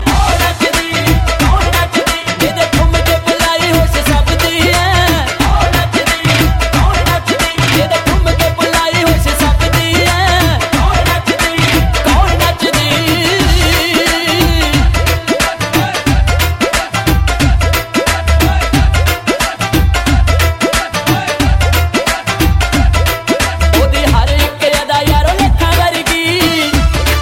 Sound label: Punjabi